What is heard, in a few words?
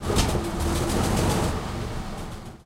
interior engine switch, engines tanks; War Thunder